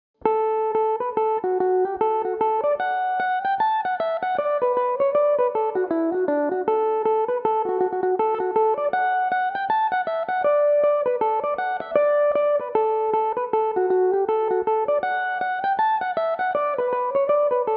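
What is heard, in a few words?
reel D major, Irish mandolin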